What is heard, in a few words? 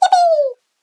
yippee; game